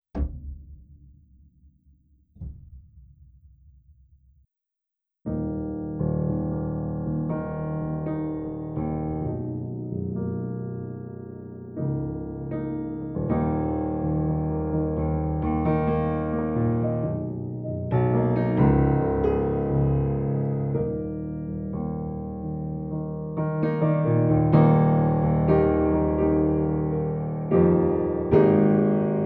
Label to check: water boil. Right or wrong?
Wrong